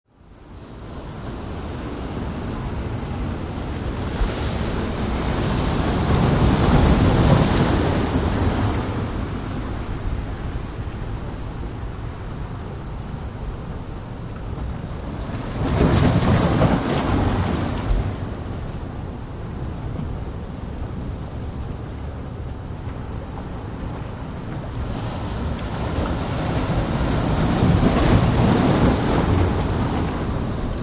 Close Heavy Ocean Surf

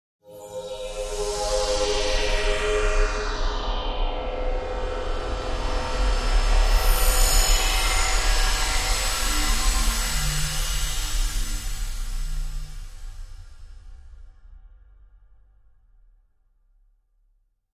Wash Elect Buzz Fluct

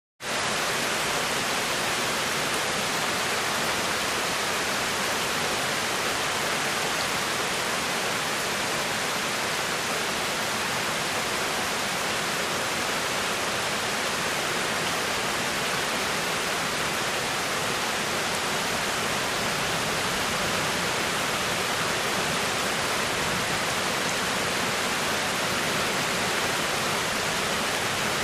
Heavy Tropical

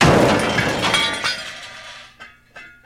Jeep Crash Debris, car crashes

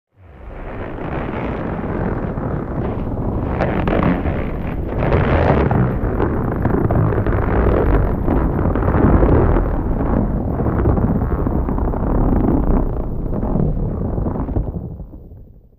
Stress Fault Pre Or P, earthquakes

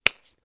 Hand Grabs Slaps Pats, flesh

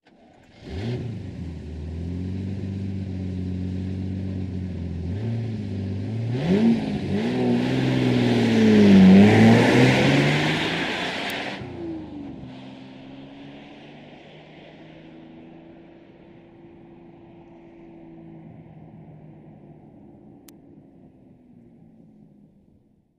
Car Away, Start, Idle & Rev, Fast; start/idle/away

